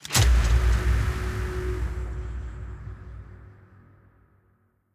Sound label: Perk Unlock